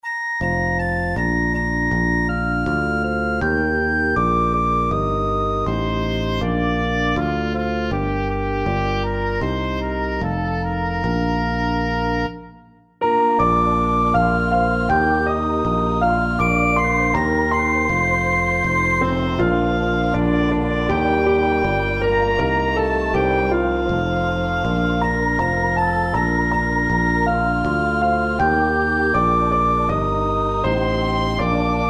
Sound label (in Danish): Orgel Klaver Bas Sangere Bb dur Syng med